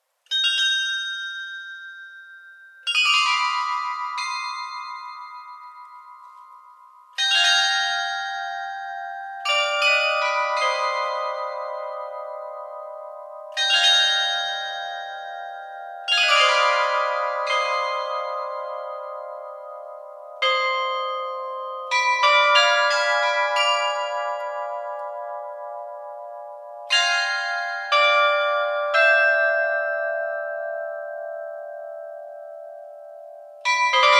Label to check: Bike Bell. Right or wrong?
Wrong